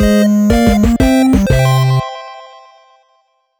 Level Completed; Miles and Kilo

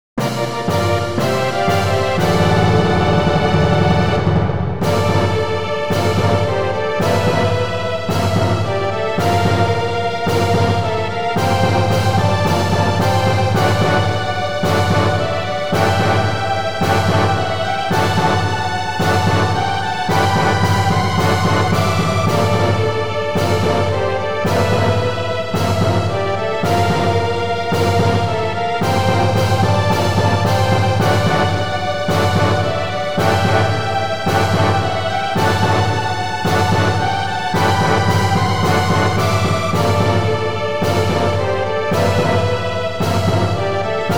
Unused Game Tune; Langrisser Milennium

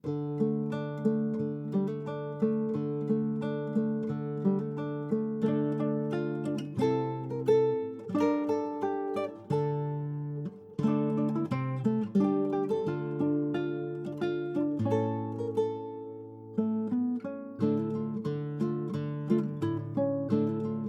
The Queen's High Seas (Lute)

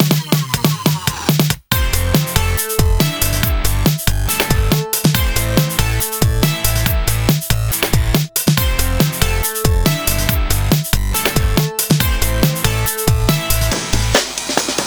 Banjo hero; Garlic

